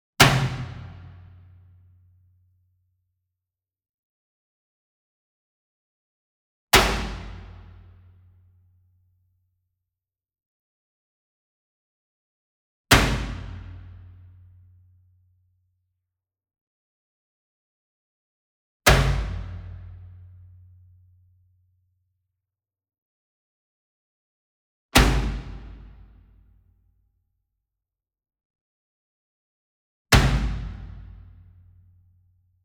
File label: SINGLE DRUM Bass Drum Marching Hit Whip Beater Medium; boom library; Cinematic Strikes Construction Kit